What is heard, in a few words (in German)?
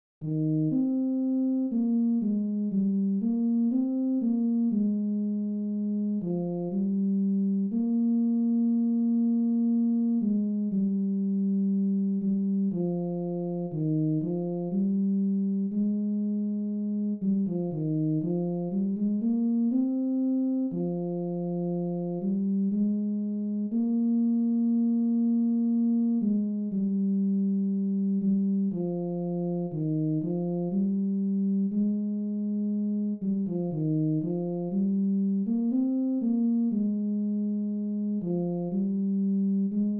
tenor